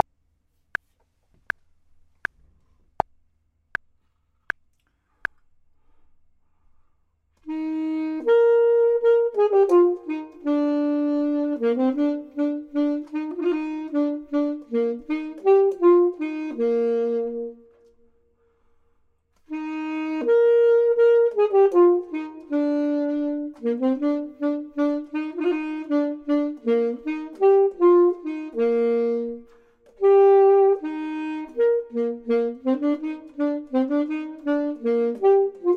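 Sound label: bottom, Saxophone and Clarinet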